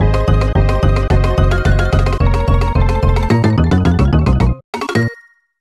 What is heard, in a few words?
Bonus Lose; DKC; Beat Saber Clear Fanfare